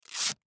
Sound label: Scotch Tape Peel, Scotch Tape Peel Piece; Scotch Tape Peel Piece Short; Tape Sound Effects; Offices; Foley; SoundEffects-Blastwave